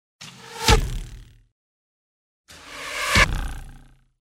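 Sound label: arrow sticks into target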